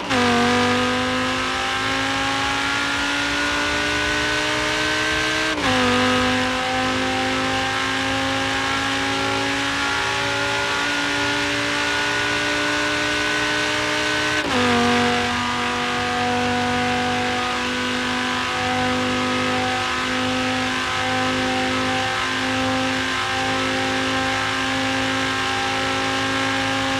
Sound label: fourth cruise, vehicles, audi v10; autos; skyautos